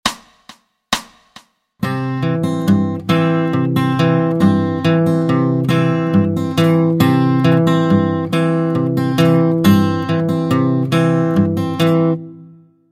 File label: Travis Picking; the hemiola effect employed in Fig. 4 results from maintaining our familiar bass line while plucking our “C” (1st fret, 2nd string) every three eighth-notes.